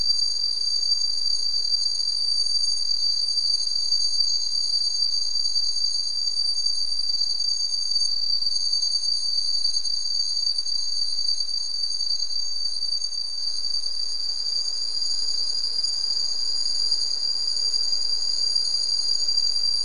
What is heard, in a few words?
Whistle